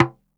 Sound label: TOY TOM; AKAI S6000 CD-ROM - Volume 1